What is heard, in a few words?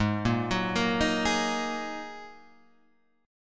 G sharp 7th Suspended 2nd G sharp